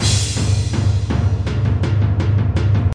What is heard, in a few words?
intro free spin; king of 3 kingdoms